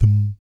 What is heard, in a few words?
BASS THUM; Spectrasonics; Vocal Planet; Jazz FX